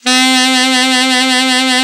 ALT SAX, D3; Best Service; Alpine Volksmusik

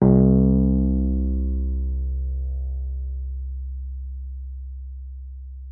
WURLITZER, C2; Xsample VOL-1; 90 s Sample CDs; WURLITZER RS

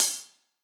Acousti Hat Hit, hihat; ultimate hihat; Hits

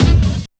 BD, Dance Mega Drums, HIP

